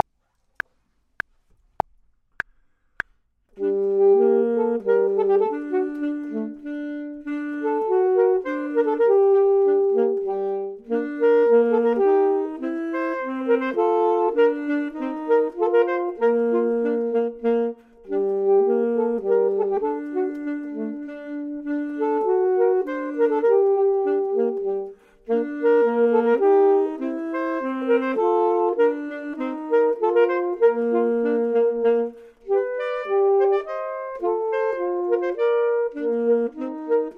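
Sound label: both, Saxophone and Clarinet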